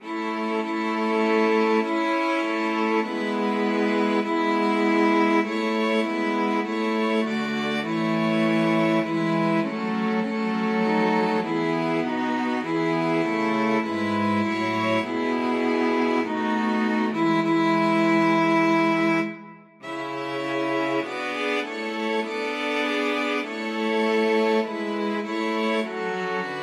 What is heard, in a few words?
STRINGS, string quartet